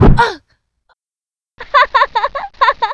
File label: Mob die; Monster; 뇌영대; 뇌영대주